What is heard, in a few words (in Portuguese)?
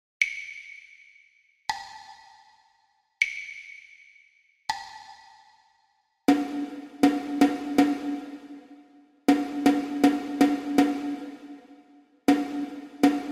percepcao ditado ritmico